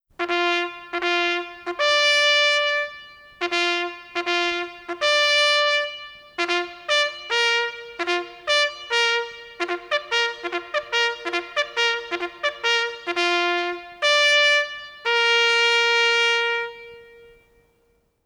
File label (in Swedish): Revelj